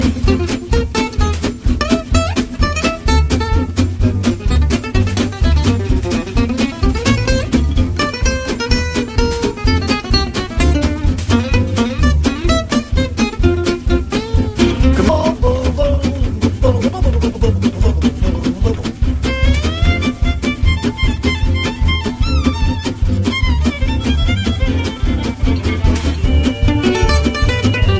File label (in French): Jazz manouche extrait; petite histoire du jazz